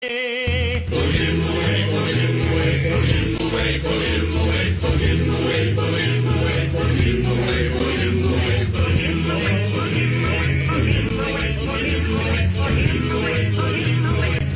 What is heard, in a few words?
vote him out chants